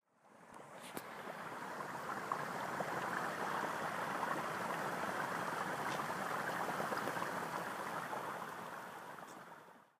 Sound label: taiwan boiling hotsprings